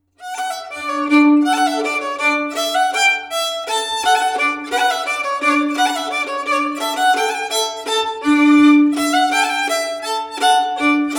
Mazurka; Cassa nozilhas

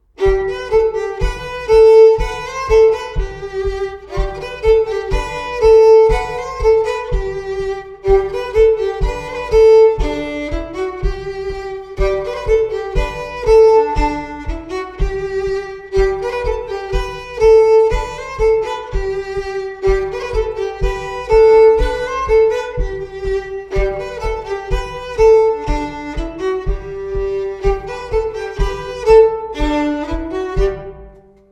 Bourree saintongeoise; ça Not minagere

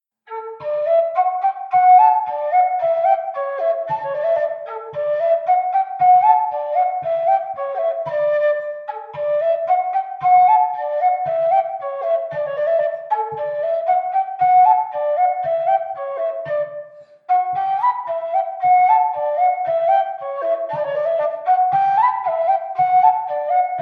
Bourree; Recueil De Raoulx

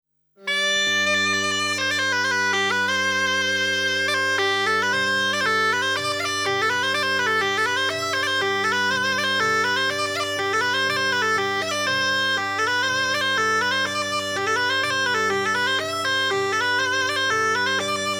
Polka; Polka d Edgard

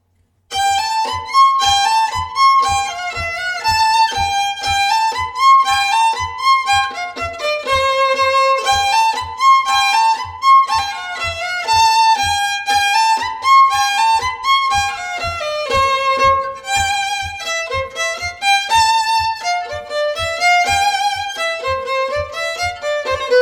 Bourree; Recueil Hainl; Bourree de Maringues

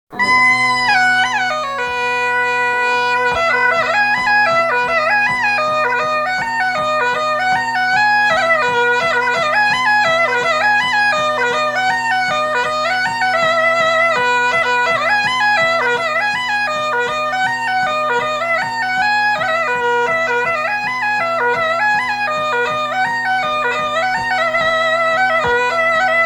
Mazurka; Du Pai Tiro

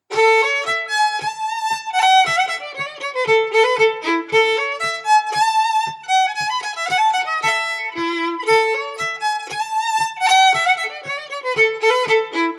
Bourree; Ms Aurillac

